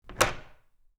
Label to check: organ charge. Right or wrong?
Wrong